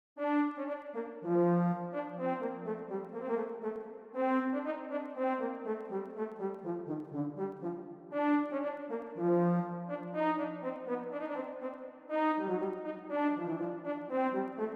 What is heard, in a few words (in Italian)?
corno